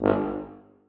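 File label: tuba staccato g2; Sonatina Symphonic Orchestra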